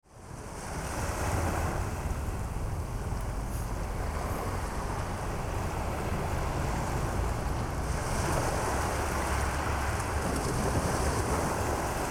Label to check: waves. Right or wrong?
Right